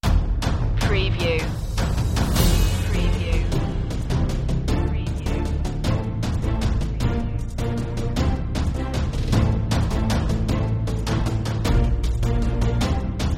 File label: celtic underscore